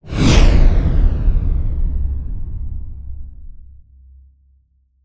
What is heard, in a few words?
Swell To Impact Flanged; bottlerocket